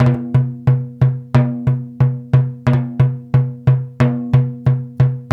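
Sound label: 90 bpm, American Native; Spectrasonics; Supreme Beats; World Dance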